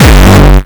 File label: Bombo Hardcore, kicks